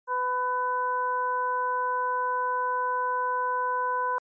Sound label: AM 1000 sin 10